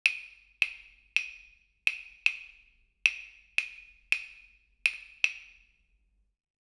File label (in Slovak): claves ozvučné drievka